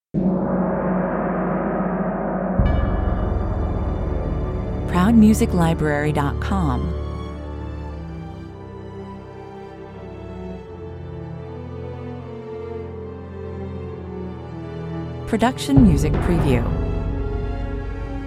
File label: gate of changes 124 bpm, A Big Gong opens a brief, Slow-tempo Modern Orchestral Piece; signals Doom or something big and mighty approaching or about to happen